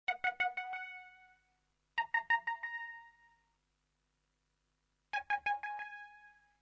Flourish; Wonderputt online gamerip 2011

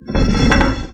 Girder Move

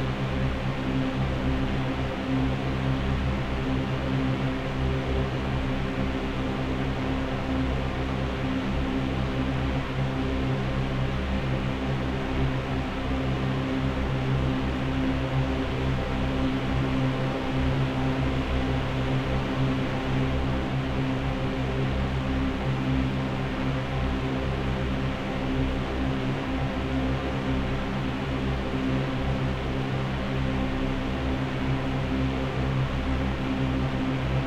Music Base Loop; void bastards macos ps4 switch windows xbox one gamerip 2019

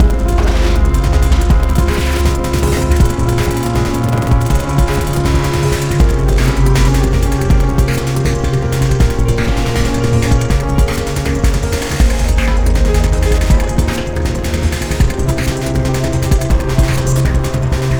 Waterworks Murky Medium; rive wreck hack die retry; ps4

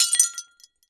Shatter Blast; blastmorph pinball; xbox series xs

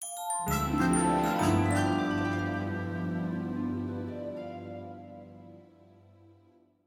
Stinger To map

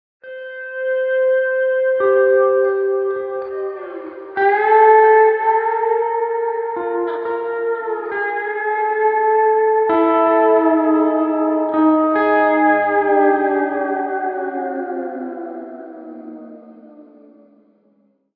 G steel

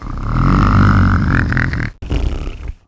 Snoopy Snoring, game watch

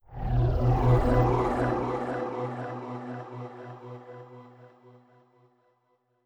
basic Mood

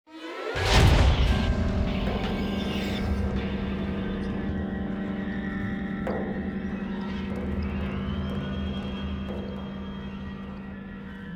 shock riser; Joe's Diner

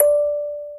Vibraphone D; Kaminazo: Mirai kara no Omoi de

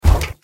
Spring run step; gamerip; the joy of creation halloween

